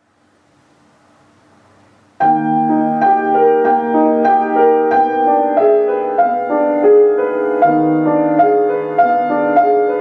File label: blurred pedal changes